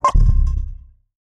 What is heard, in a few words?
Beep Evolver; Adobe; Multimedia Internet CD-Rom Flash